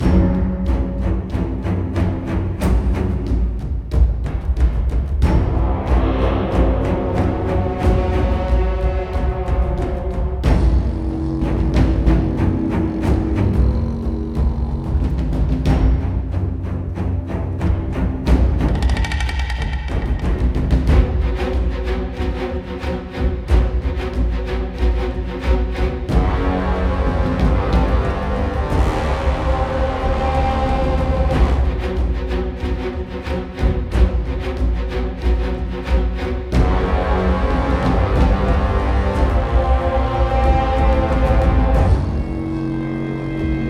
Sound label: Bossfight Stage Action; ryse son of rome 2014